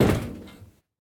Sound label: metal step; Golden Crayon Sounds